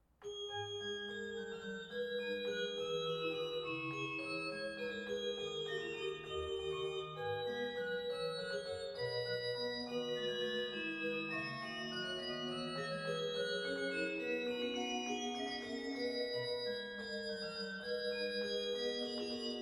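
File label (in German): Sei gegruesset, Orgue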